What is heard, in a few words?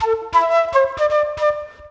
flute, ethno; eJay